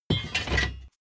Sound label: plate armor put down